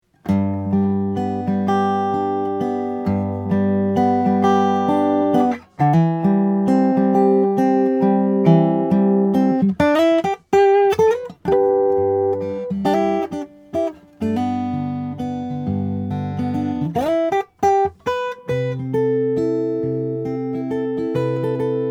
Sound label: rainsong co ws1005nsm; marine blue